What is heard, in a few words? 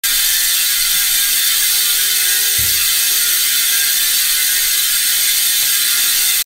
hope pro5 sound check, hubs